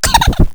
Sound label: pain; faerie